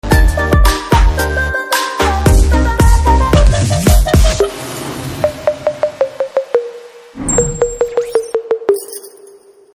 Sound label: BGM Ringtone